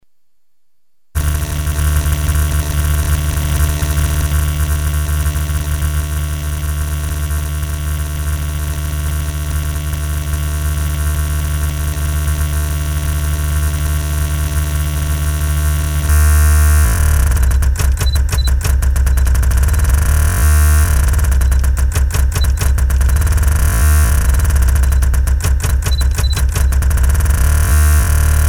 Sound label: Ditty in Arpeggiation typewriter